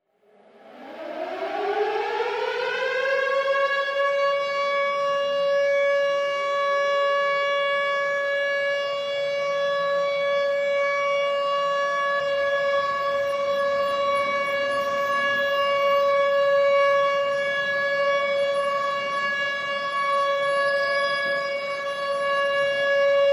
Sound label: Air Raid All Clear; SOUND EFFECTS1